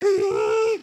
generic build

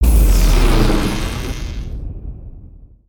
Lightning Tornado; Spinjitsu

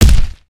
Creature Footstep

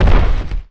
Dragon Foot Right

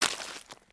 Foot Mud Walk Light, footsteps

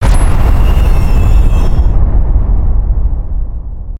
pounce; creatures organic

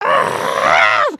frustrated; emotes

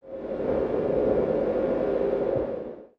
Wind Cave One Shot; ambience; Lego

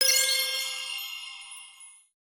score float; aronwk collection; ndaudio

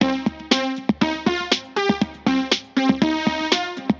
Boombox Beat

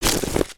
Large Pet Digs; creatures pets

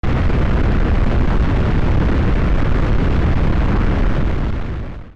rocket flying loop

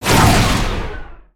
super cannon fire; destructibles

